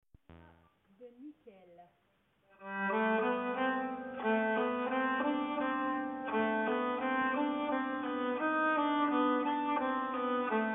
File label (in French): Nyckel Seconde, nyckelharpa